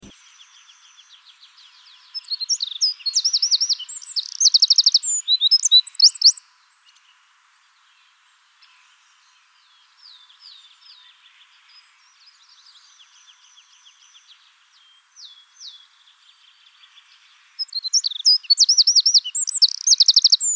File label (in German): Zaunkönig Gesang; Wiesloch